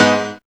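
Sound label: PIANO CHORD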